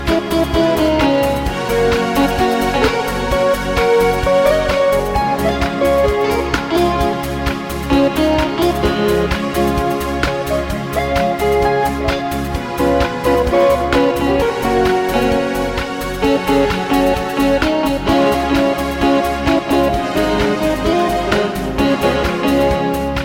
A Happy Schlager

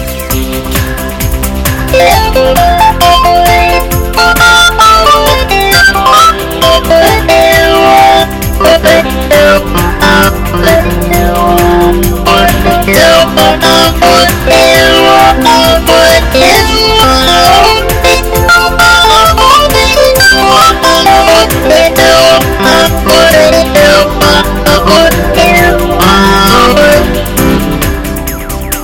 Fast Nineties Dance

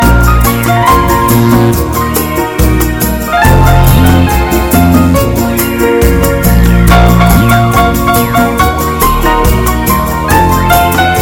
Pretty and Smooth Bomba

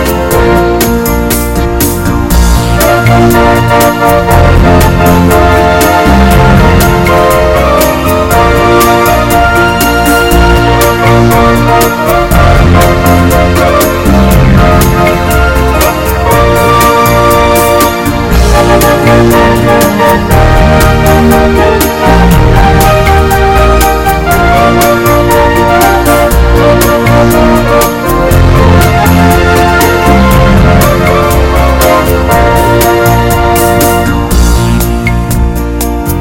Beautiful Ballad

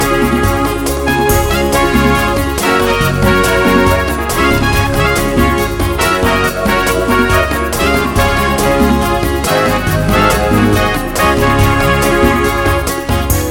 Hot and Fast

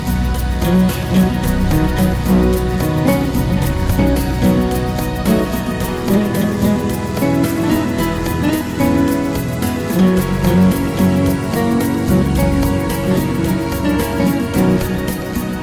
Beautiful Eight Beat

